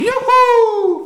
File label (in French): youhou